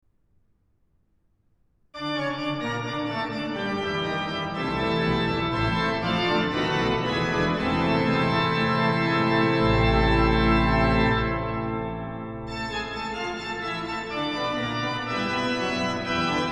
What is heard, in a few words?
Tutti; Kampen II; Psalm 14 Preludium